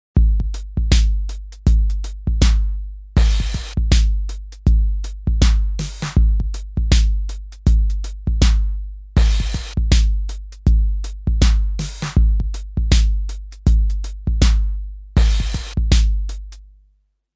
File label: Early 90 Hip Hop